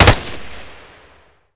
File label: m4a1 unsil, weapons; garrysmod; m4a1 customizable